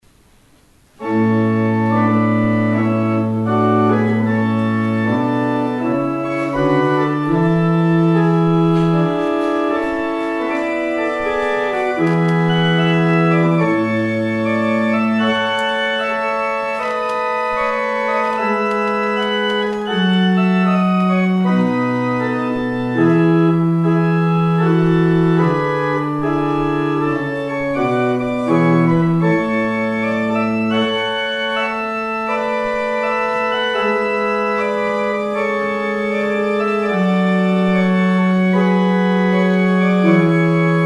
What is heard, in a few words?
organ outro